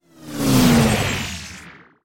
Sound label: Whoosh; Sound Effects Bible